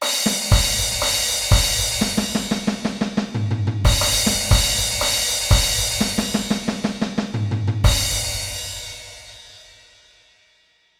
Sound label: 60 bpm, batteur